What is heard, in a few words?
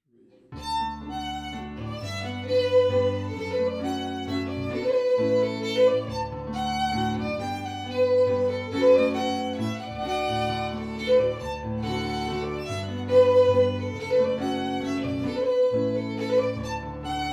Waltz FIDDLE PIANO; Jink & Diddle Book o' Tunes 2021